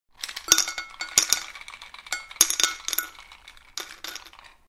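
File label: sound of falling cubes; kubikov lda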